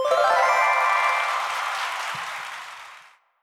Notifier (Achievement Complete)

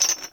Step Chain

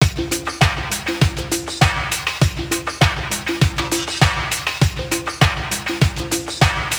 Result Normal Jingle

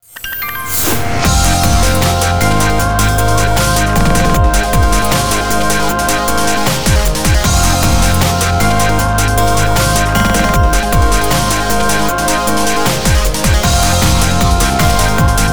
Bgm Opening

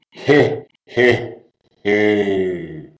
Hohoho; Talking Santa; Android

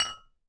Impact Flask

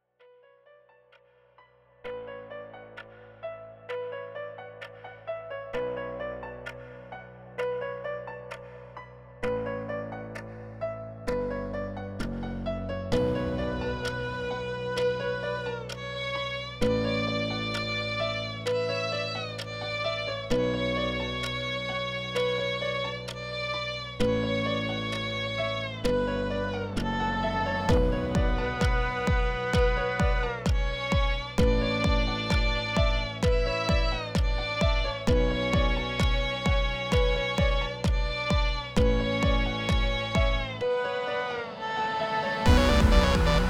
Melancholy (Instrumental); The Amazing Digital Circus: Funk Off Reality

